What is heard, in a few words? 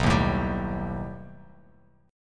B Augmented 7th B down Bass Standard; strummed; JGuitar